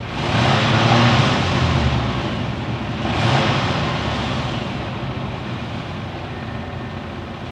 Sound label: Cadillac Low Med Revs